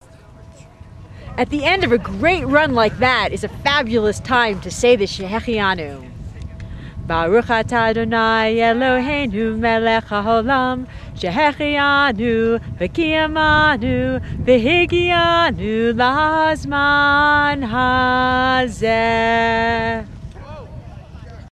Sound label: Shecheyanu Chanted